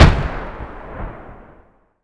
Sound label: grenade explosion far